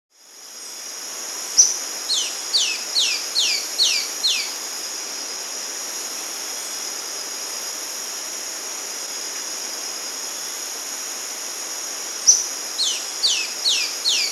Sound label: Tawny-Breasted Flycatcher (Myiobius, S. America